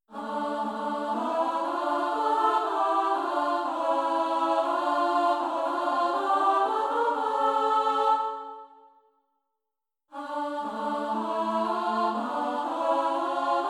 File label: Sop Alto